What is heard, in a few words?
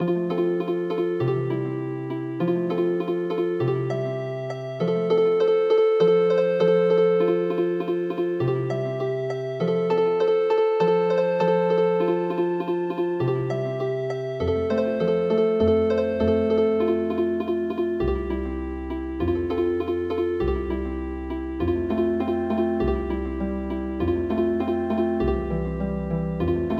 KOMORIUTA Healing Harp; midi traditional